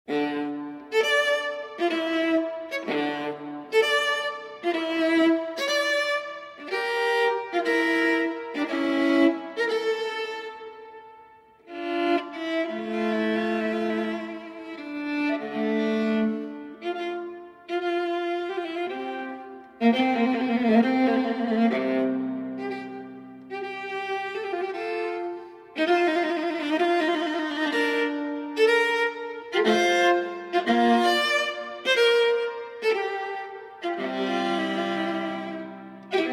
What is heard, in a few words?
Fantasia For Violin Without Bass in A Minor, Moderato; Arr. For Viola